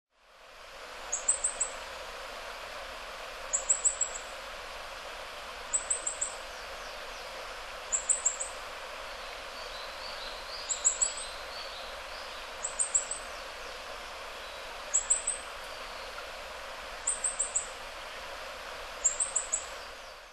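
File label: Japanese Robin; koe; hyogo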